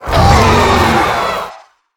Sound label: Death